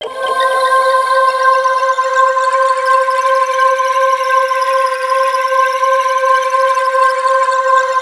univerze, pads; Rubber Chicken Software Sample Bank; REAL INSTRMT

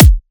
drum kick smack; Virtual Riot; Drum Hits

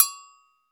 AGOGO BELL; AKAI S-Series CD-ROM Sound Library VOL-5

